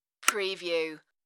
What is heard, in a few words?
switch relay